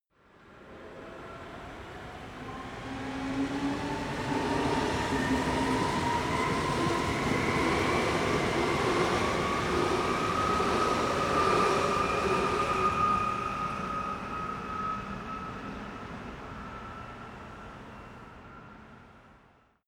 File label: train city accelerate fast